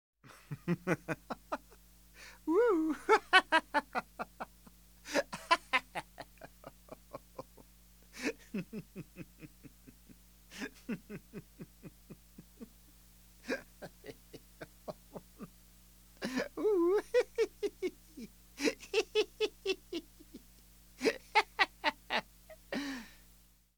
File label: Man Giggling; KMP Film Video Sound Effects